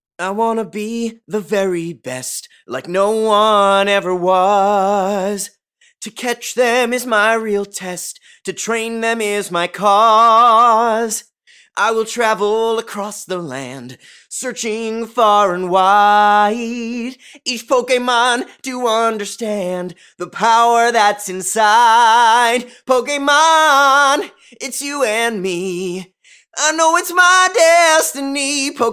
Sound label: Singing